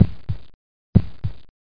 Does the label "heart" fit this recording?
Yes